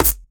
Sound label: original snare one shot f key